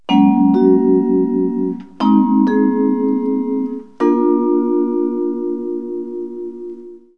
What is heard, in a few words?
Vibraphone Cadence; Music